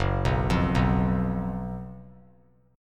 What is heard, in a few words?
F sharp Major 7th Suspended 4th; F#M7sus4 arpeggiated